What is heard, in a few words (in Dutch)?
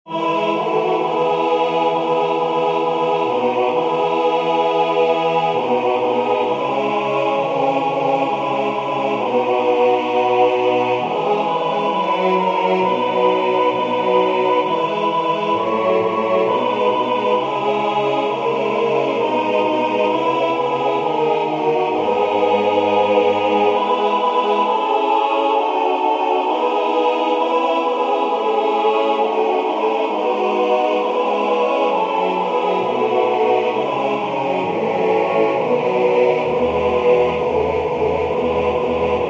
Tutti, koor; Notre Pere